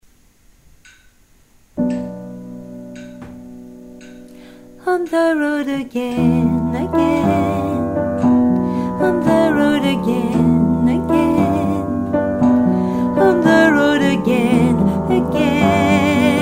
alto

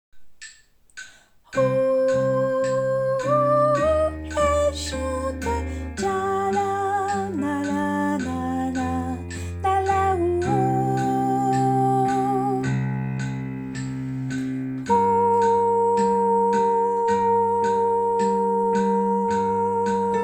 tenor

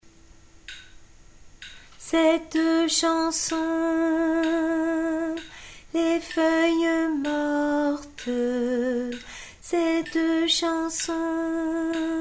alto; c'est une chanson

